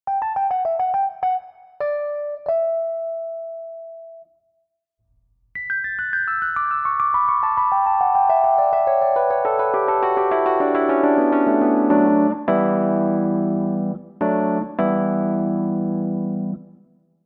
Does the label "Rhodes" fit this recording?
Yes